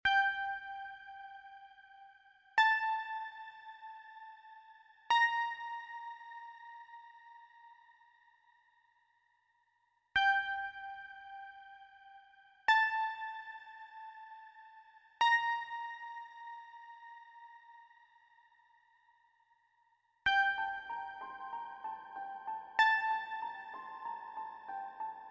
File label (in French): musique ambiance